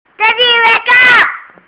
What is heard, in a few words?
Alarm